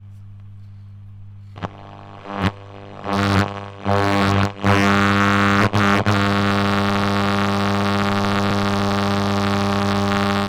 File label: Retro Radio, Loud, Static and hum with frequency change; Sound Ex Machina